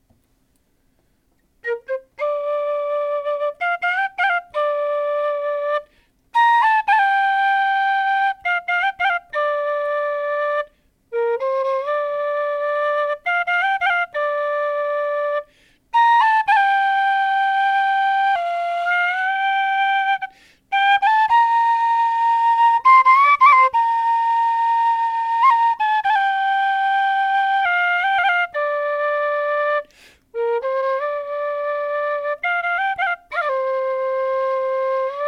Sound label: A 100 Irish Whistle